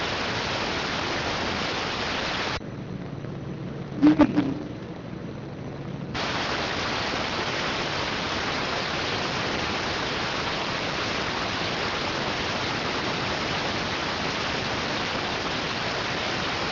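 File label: radioteletype receive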